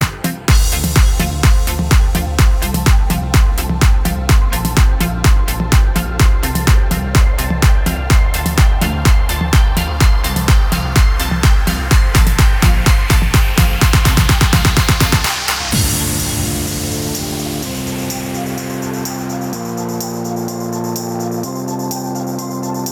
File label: Instrumental